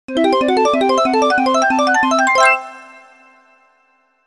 Dingpot Recharge; Banjo-Tooie

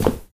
Player Footstep; those nights at rachels gamerip 2015

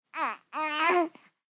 baby three weeks old cry

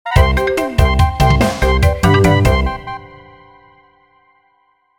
Minigame end; Bomberman Max 2 Arranged; GBA; Bomberman Max 2 remake

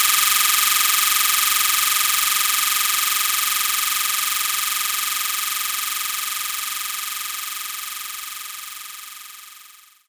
Guiro Short; knights try

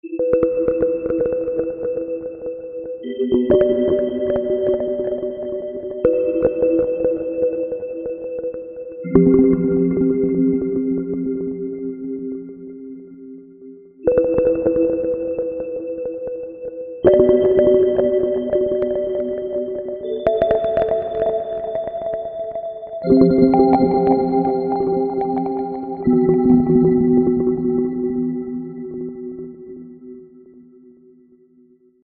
newsfeed loop; Dokobots

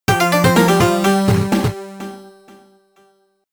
Level Complete!, amiga

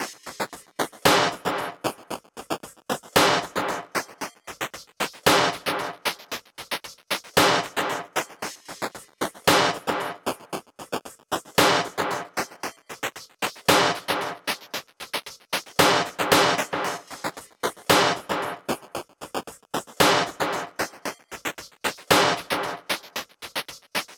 Dubstep (Bassless)

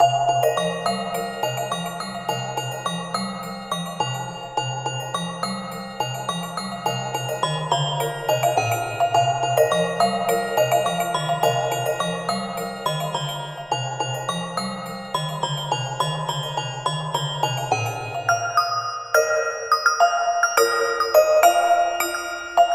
Bells

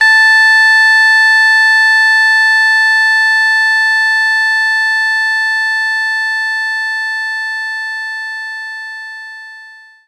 double reed A5

